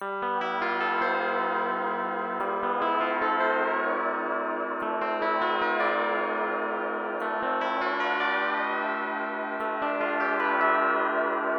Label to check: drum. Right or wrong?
Right